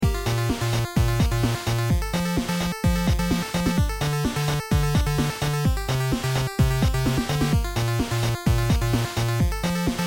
raver beat

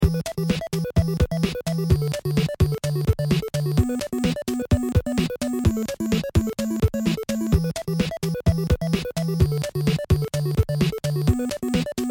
beat 57

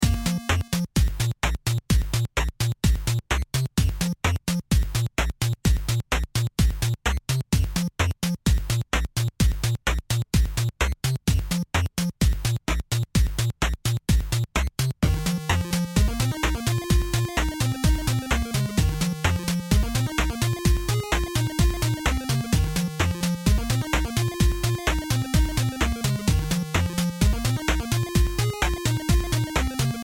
beat 23

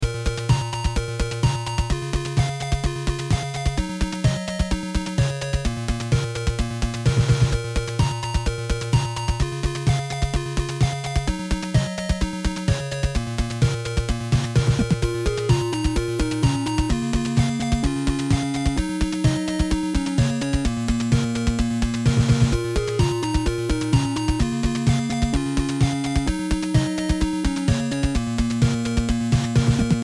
beat 63

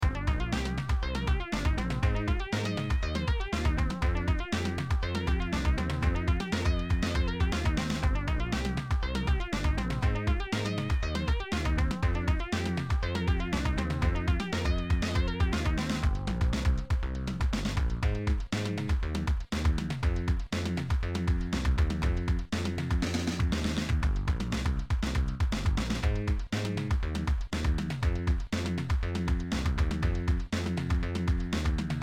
bass basstone lead sound beat

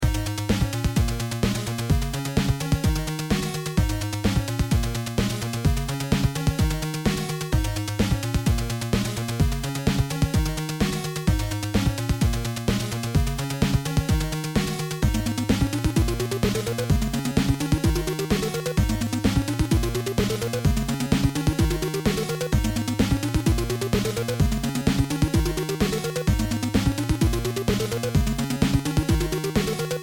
beat 50

